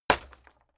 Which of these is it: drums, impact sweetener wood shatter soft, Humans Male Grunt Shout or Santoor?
impact sweetener wood shatter soft